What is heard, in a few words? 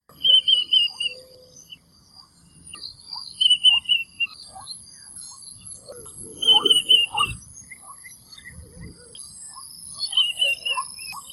Little Bronze Cuckoo calls; Ipoh Perak Malaysia